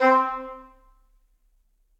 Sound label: English Horn Solo Staccato; Ableton; Orchestral Woodwinds